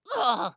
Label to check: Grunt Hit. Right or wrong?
Right